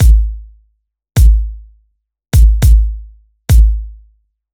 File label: BEAT, Roots Funk; USB Soundscan vol.41; AKAI